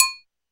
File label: agogo; Drums; Ableton Foundation FreePacks